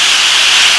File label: Applause; SNES; Total Football